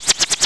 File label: harpy attack